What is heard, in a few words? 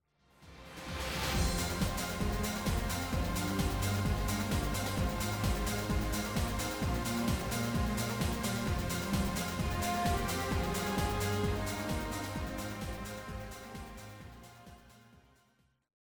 Stadium Start BGM; game rip; lost planet 2